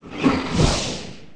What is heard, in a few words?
Giant Blade; Sotha; The Elder Scrolls III - Morrowind; Morrowind GOTY GOG